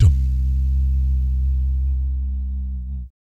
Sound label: BASS DUMS; Spectrasonics; Vocal Planet; Jazz FX